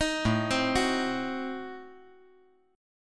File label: A Diminished 7th A down